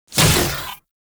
Shot; Warframe; The New War; Weapons; Omicrus

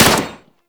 Pistol Shot; Warframe; Tenno; Guandao

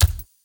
Steps; Characters; Warframe; Kalymos

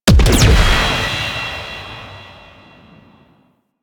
Jamming Drone Explosion; Warframe; Corpus; Corpus Railjack

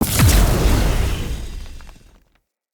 Corrupted Battle Dog Sub Boss Proj Explosion; Combat

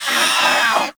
Feral Vocal Death A; Warframe; Catbrow

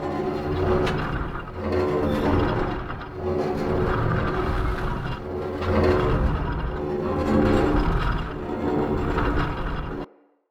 Rotator Loop; Encounters; Ode Pollution Tower

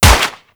Uzi Shot; Tenno

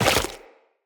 Run Goo, Footsteps; Characters; Tenno

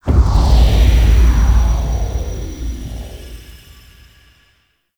Transition Stinger In; Warframe; Tau

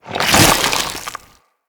Infested Bone Blade Mouth Foley; Enemies

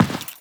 step default, footsteps; Warframe; Teshin

